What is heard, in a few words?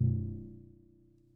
Prepared Piano